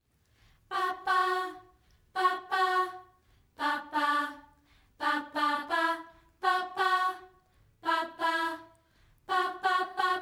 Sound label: Oh Happy Birthday Upper